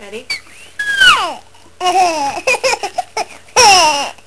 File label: laugh